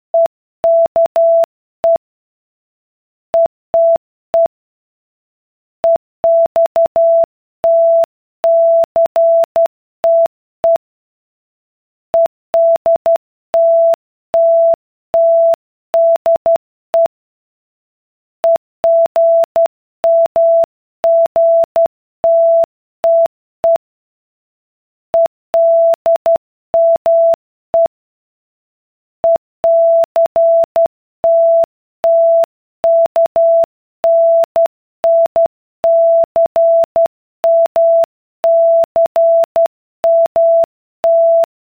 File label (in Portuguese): ritmo velocidade